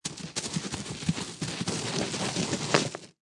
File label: Creature Scamper, Animal, creature, or person scurrying, scampering; quick footsteps on dirt ground; Monster; Lynne Music